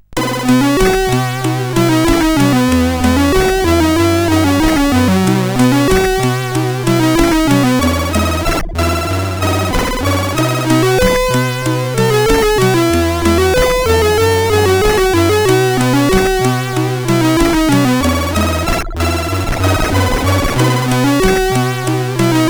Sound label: Intro, CSG8580; sid; CSG8580R5